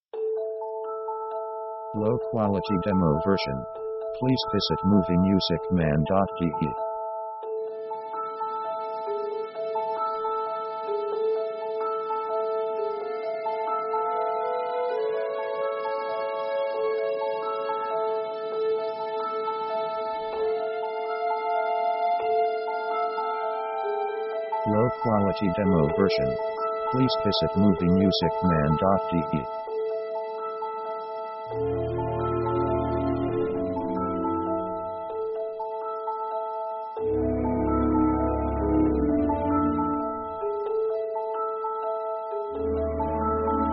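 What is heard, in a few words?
orchestra slow accompany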